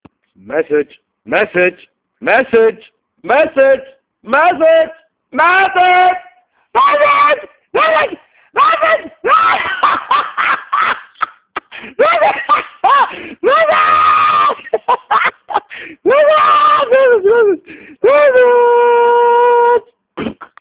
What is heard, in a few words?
SmS